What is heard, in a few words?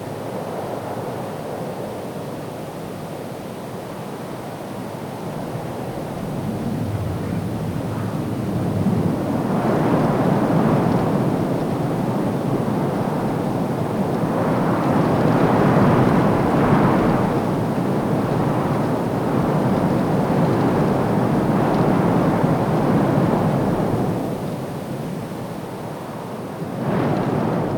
Air Loop; moul